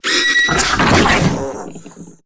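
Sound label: creature langlatch attack light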